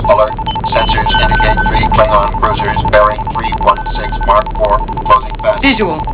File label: ALERT